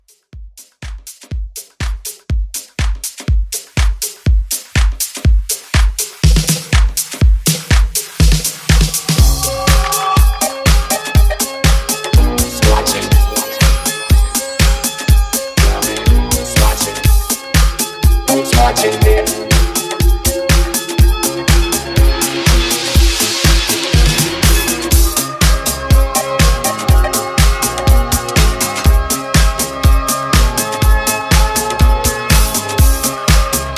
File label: 80s Pop Mashup 122 BPM